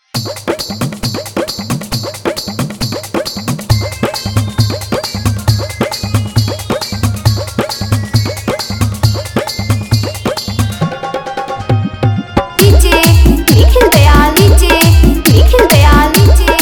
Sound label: Old Bhojpuri Dj Remix Song